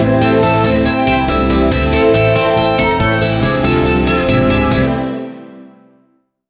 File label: small business jingle wit, advertising